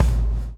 BLOW; Spectrasonics - Backbeat

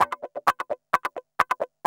GUI, LICKS; 130 LICKS

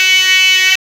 XTRA PERC

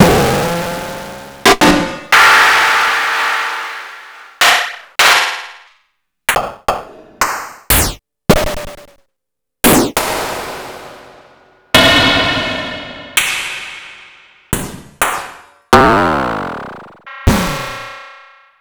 Snare Weird

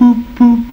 BOOP BOOP; AMG

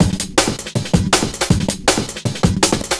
Classic Beat, Drum N Bass

